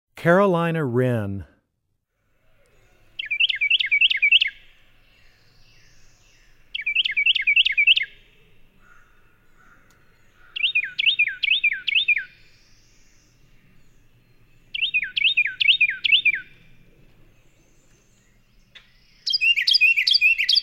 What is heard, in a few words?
Carolina Wren, Wildlife Sounds